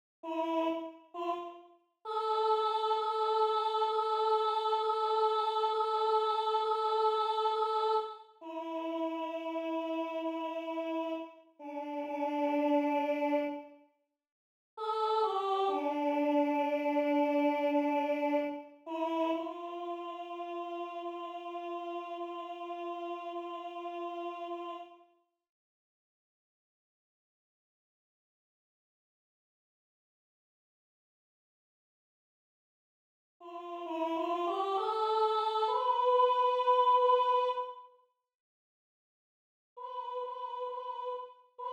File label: alto